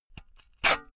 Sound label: saw use short unsteady